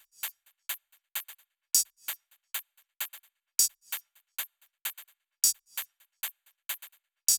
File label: 130BPM Kit; Vengeance Samples; VFH1 130BPM Danzon Kit